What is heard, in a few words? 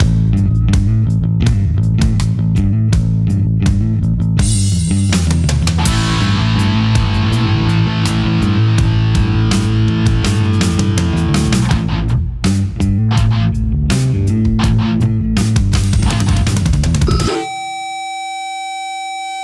Bass Track; Lars Bass Friend Track Mix